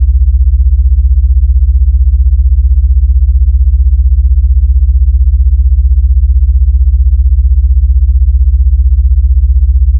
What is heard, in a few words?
63Hz